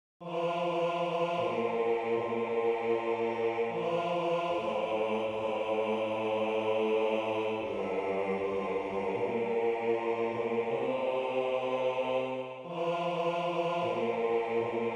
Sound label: Bass